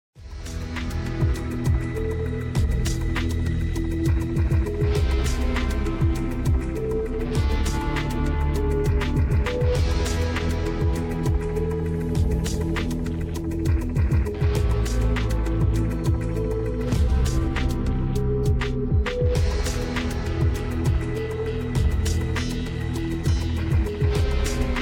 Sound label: guitar theme; fortnite